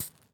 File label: Short Hydraulic Pump Action